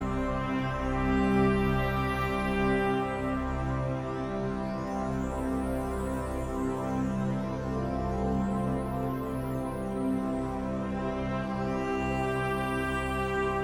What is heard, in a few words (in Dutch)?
panfluit instrumentaal